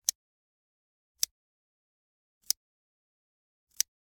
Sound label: Generic Click Metal Tiny